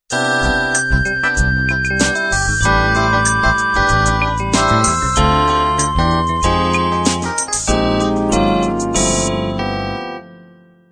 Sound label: 16beat soul intro